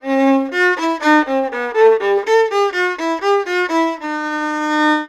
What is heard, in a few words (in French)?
VIOLON, ArabianTraditions; USB Soundscan vol.43; AKAI